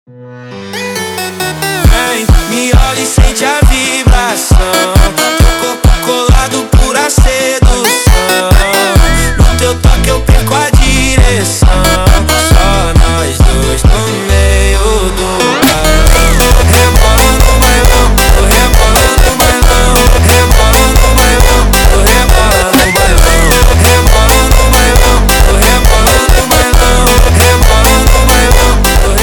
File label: funk